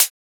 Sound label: Closed Hat; FLPRO